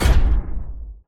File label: mech Step Heavy, movement; Mindustry